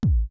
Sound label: BD